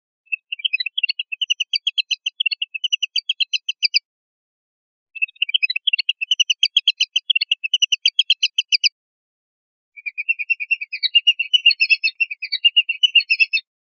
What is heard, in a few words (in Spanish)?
rep bailoteos, pardillo